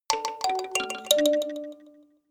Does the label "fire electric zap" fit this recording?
No